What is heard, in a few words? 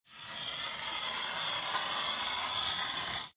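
sounds of playing checkers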